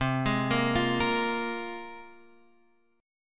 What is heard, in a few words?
C 7th Suspended 4th Sharp 5th, C